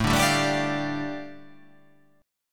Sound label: A 7th Suspended 4th Sharp 5th, A, down